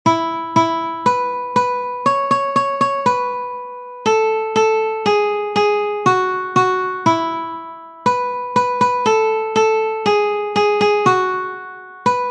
Baa Baa Black Sheep Guitar One String

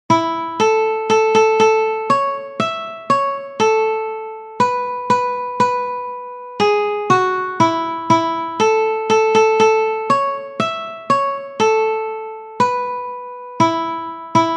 The wheels on the bus guitar one string

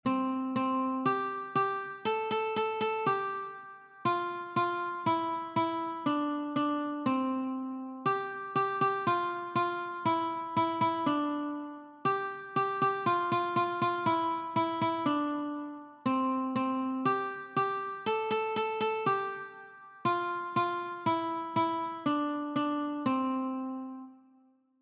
Baa baa black sheep Ukulele

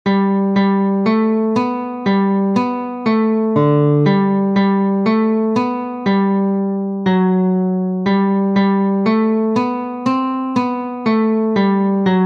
yankee doodle guitar several strings

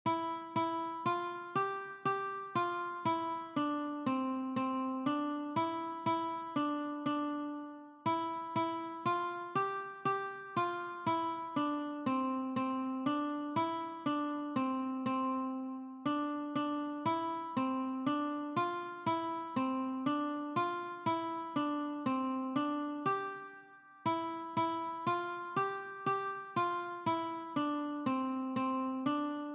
Ode to Joy Guitar

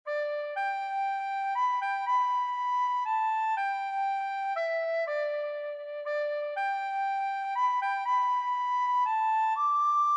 Amazing Grace Recorder

